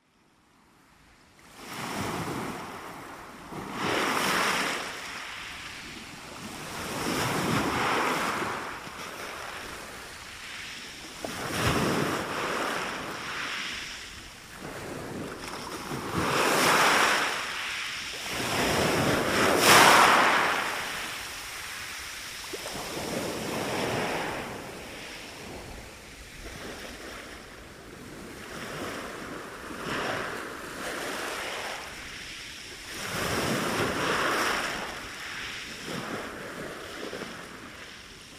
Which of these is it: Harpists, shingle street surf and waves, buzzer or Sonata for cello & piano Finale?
shingle street surf and waves